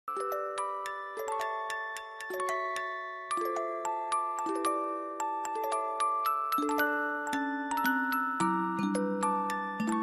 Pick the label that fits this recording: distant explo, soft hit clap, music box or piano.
music box